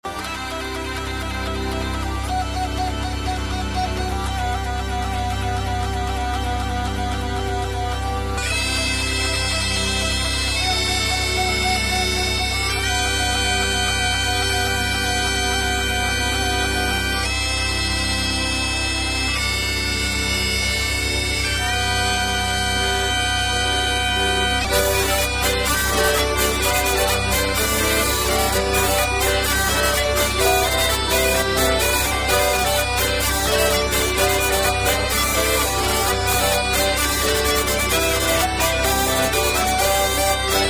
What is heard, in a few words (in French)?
folkloriade, Dauphiné Auvergne Limousin